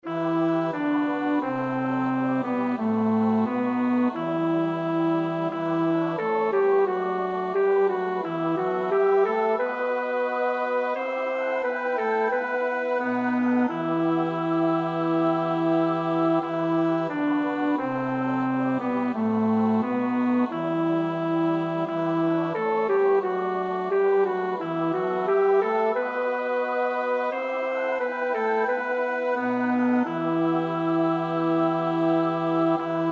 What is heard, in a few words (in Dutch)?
bas, koorpartij oefening; Bach (Johann Sebastiaan)